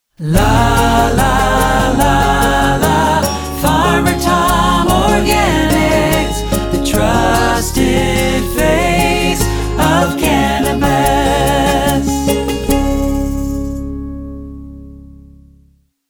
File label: organics jingle; farmer tom organics